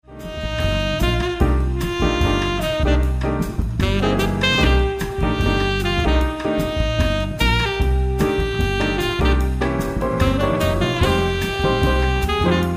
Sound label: Jazz Theme With Sax Keys Drums